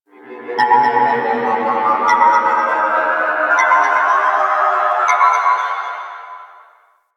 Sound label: Corpus Ship Exterior Satellites, Ambience; Warframe; Corpus Ship Remaster